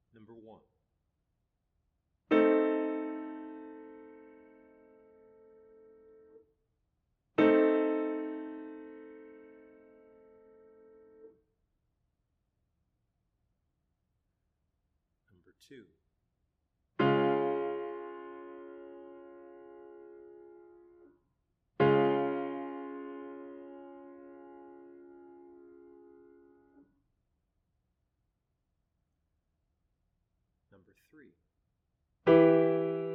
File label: chords; ear training